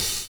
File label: HH OPEN, Drums; USB Soundscan vol.11 - Drums Dance & Groove; AKAI